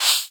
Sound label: ui swipe